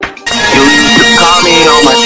jumpscare

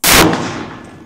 50 caliber gunshot

